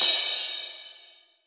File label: crash cymbal sound B key; cymbal drum samples